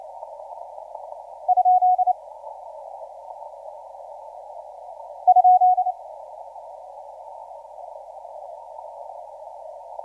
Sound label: 20m